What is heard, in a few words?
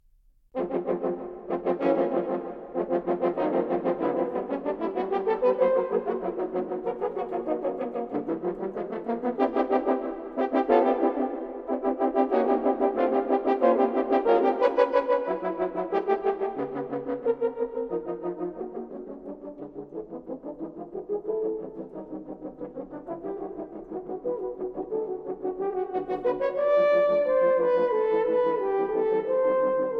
Quartet for Horns, Vivace